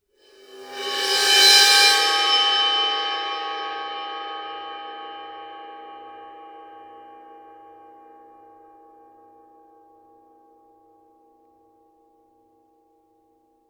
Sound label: crash bow, Suspended Cymbals bowed; Percussion